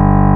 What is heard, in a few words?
SYNT BAS G1; Big Fish Audio; Synth City